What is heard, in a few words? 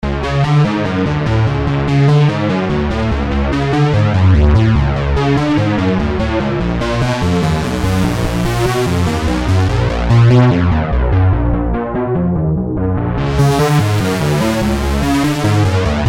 bass ebm; Creamware minimax